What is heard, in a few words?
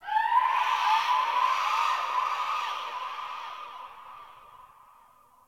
Venus Far Distant Creatures; Warframe; Venus Open World; Venus Landscape; Ambience